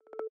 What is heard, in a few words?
keyboard press clear; iOS Sounds